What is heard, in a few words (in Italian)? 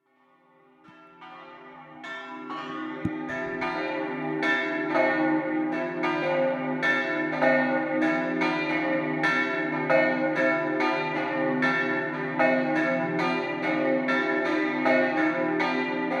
campane Doppio; Forgaria